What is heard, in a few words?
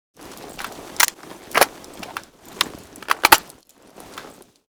gauss reload